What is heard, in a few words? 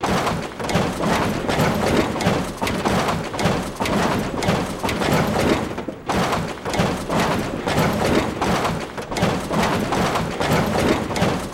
Vehicle Bumps Rattle; hits & rattles